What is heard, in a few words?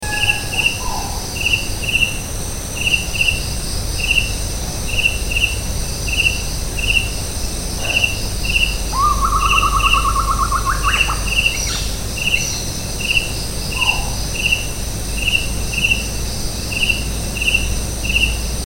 collared scops owl Otus lettia & unk, Kaziranga